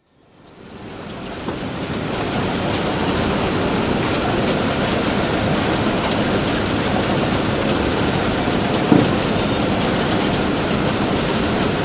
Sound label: Automatic letter sorter, start, run & stop; 1975 onward; Miscellaneous Office; BBC Historical